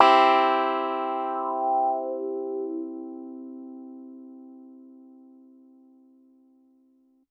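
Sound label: Piano Clav major hard; TrashLibrary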